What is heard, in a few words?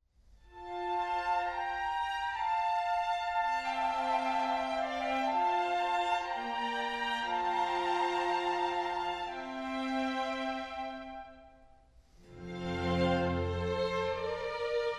Concerto in F Major for Two Horns and Orchestra - Arioso; Baroque.horn